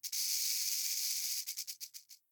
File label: Rattlesnake; The Town; 3D Environment Clip